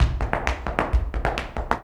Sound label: Flamenco, ZAPATEADOSOL